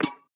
Peg Leg Footstep

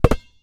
Street Ball Rebound Grab; SFX Kit

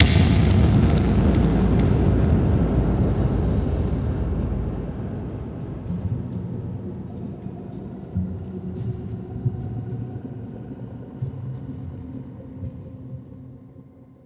BOOM, Cinematic Metal Impacts

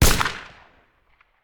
Musket; Sound Ideas; Guns

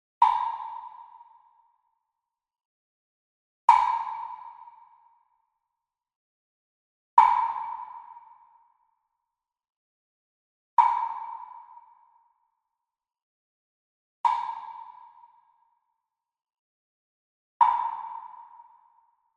Sweetener Jam Block Plastic Hit Far; boom library; Cinematic Strikes Construction Kit

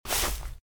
grass jump; firefight

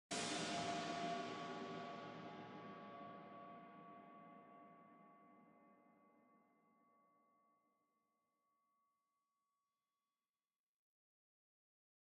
SWEETENER Thunder Sheet Hit Far; boom library; Cinematic Strikes Construction Kit

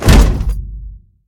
hit human; Blind Drive

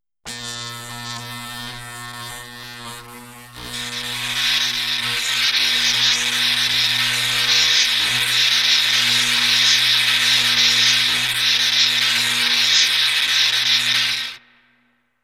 Lab Machines, Scary Sounds; 1001 Sound Effects; Halloween Horror Sounds Effects

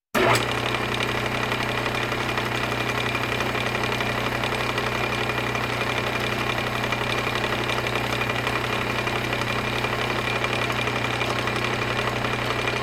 Walking Machinery Trucks; Valentino Production Sound Effects Library CD50

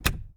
KEY Locking Metal Door; LOCKS & KEYS; mono; Universal Sound FX

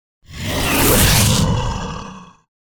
Growl Beast Attack - Monster Growl; Hollywood Edge; sound designer's toolkit v2

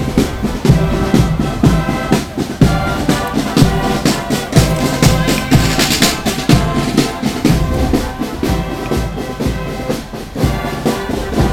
revolution music; best service Blue Box

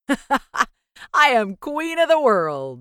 win; Dice World

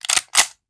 Machine Gun Foley; Sound Ideas; Guns

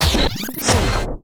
Tape Rewinding

